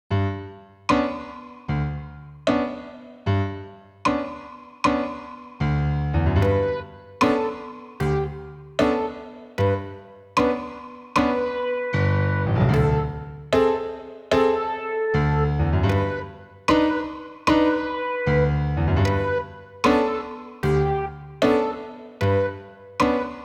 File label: Slow Accompaniment